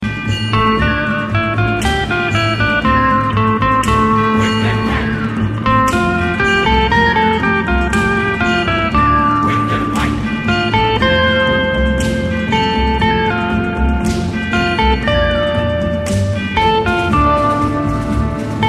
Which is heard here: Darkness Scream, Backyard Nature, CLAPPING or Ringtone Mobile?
Ringtone Mobile